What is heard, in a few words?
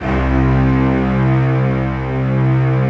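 strings section C2 L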